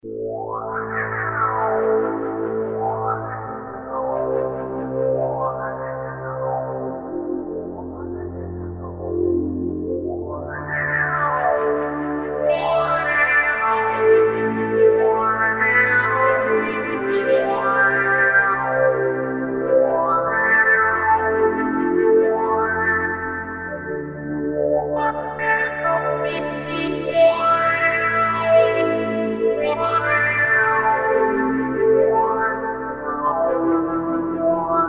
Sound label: New Wave synth, Roland MKS-50